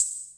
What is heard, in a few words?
hho, Roland TR-66; drum machines